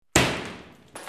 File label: whack